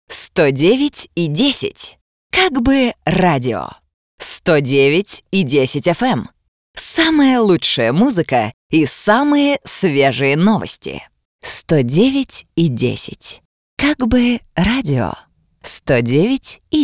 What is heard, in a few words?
Jingle